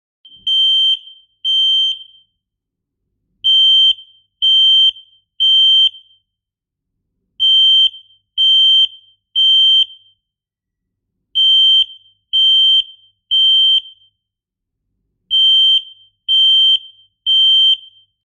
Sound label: alarm-signal, rauchmelder; Humantechnik